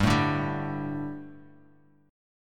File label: G Suspended 2nd G, down; Gsus2 strummed; JGuitar